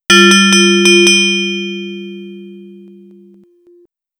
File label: alert